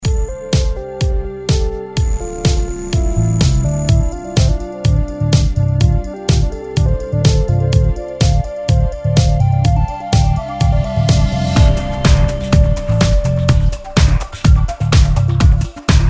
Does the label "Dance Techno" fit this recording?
Yes